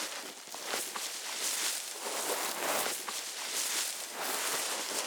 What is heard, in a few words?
dirt slide loop